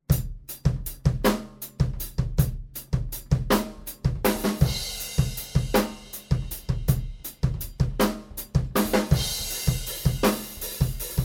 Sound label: Room Mics Horizon